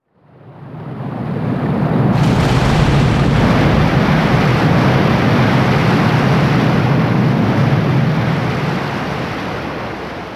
Volcano Eruption; Skyward Sword Original Soundtrack

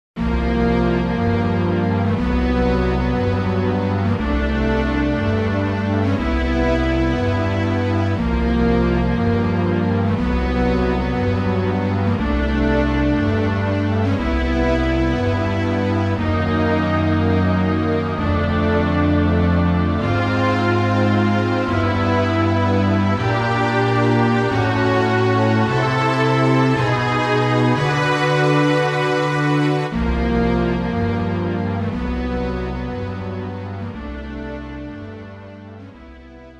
Wind Palace BGM; zelda the music

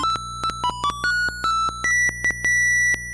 Ocarina Song; Links Awakening Original Soundtrack

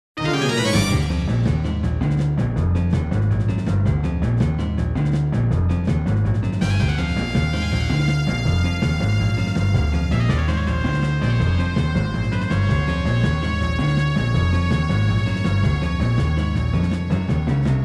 Mid Boss Battle; zelda the music

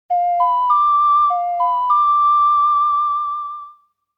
Ocarina; Majoras Mask Original Soundtrack

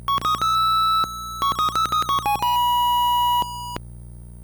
Ocarina Song; Links Awakening Original Soundtrack

